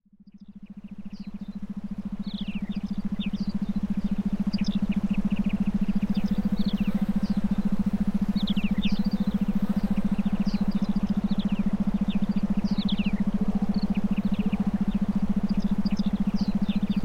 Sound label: Forest Sounds